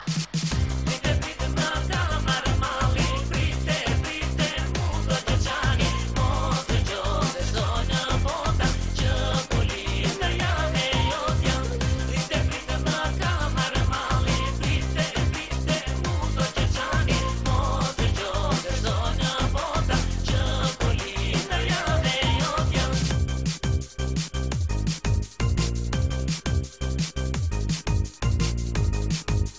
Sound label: Albanian Mix Shqip